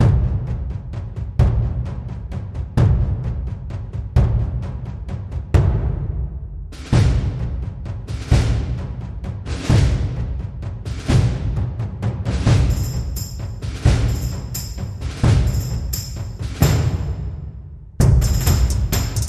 Tribal Spirit

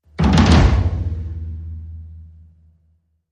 Vaudeville Drum Set

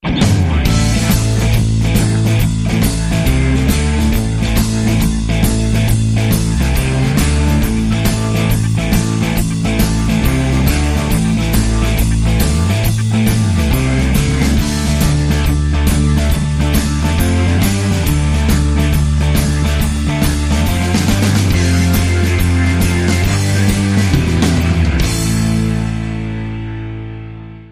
Blues

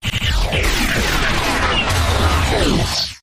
Hollow Chaos, Taylor Grand

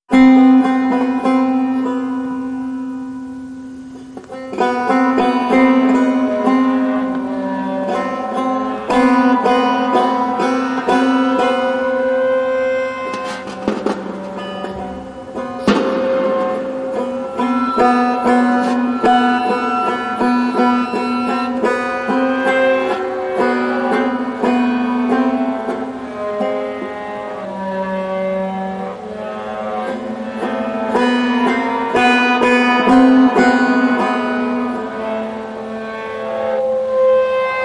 Suspense

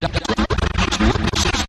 Interrupted Garble, Taylor Grand

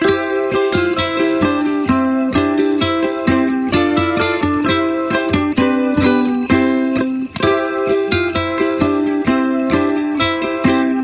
Ukulele Bells Keys

